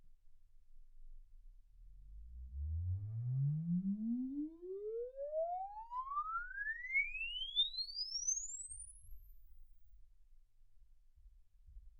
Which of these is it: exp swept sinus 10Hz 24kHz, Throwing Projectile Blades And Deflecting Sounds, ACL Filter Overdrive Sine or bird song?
exp swept sinus 10Hz 24kHz